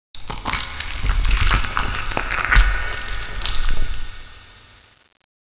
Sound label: chew